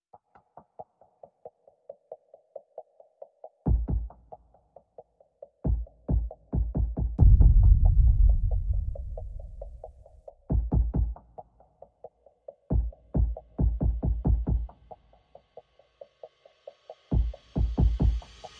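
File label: Percussion Only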